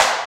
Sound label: SNR STRANG, Snares; AMG; Now CD-ROM (Roland); NOW! Drums